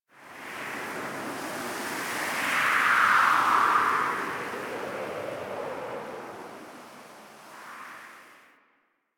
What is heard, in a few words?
Camp Wind Gust I; Warframe; The New War; Drifter Camp